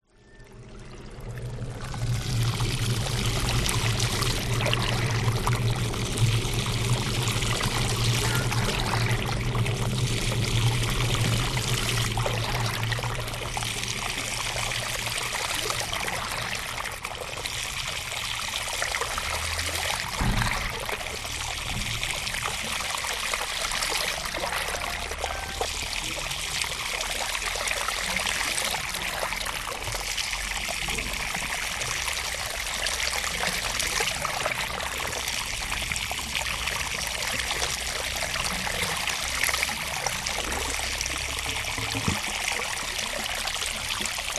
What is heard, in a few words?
industrial water draining system rice fields indonesia